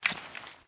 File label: Remove tv; Removetv Retake; miside demo windows gamerip 2023